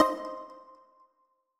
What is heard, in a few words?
on click